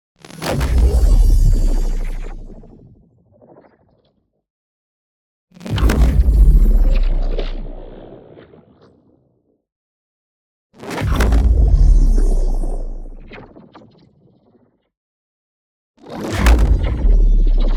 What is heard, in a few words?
NEON HIT LARGE; Primus; boom library